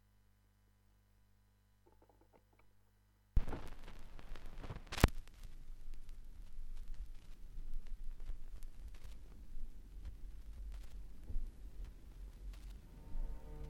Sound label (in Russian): dlia strunn orkestra; sochinenie 110 bis